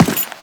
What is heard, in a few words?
Run Default, Footsteps; Warframe; Teshin